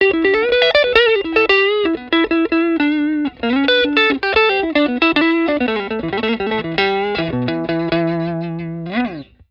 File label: STRAT NECK LICK